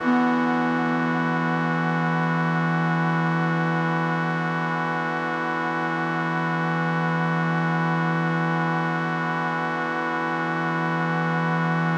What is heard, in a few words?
Mixed Winds E7:9, synth orchestra